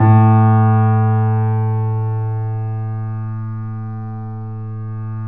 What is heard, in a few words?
3D Pianos Yamaha C7; E-MU Producer Series Vol. 5